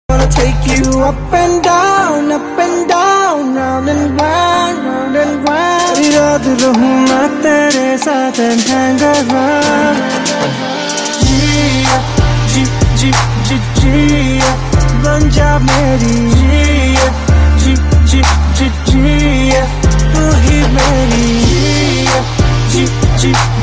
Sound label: bgm beats telugu